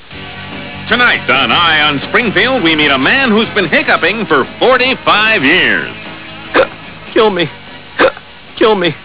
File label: hiccup